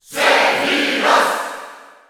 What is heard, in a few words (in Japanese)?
Cheer Japanese; Sephiroth; SSBU